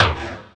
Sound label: FX Dance